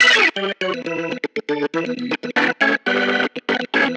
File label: Pirate Organ Riff